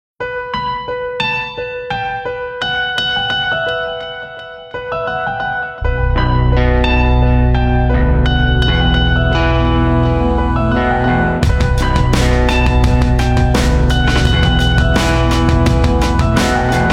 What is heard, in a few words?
Dark ambient